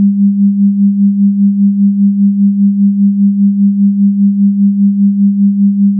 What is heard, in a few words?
sine 200 x5 x6 mono; SummerSchool2021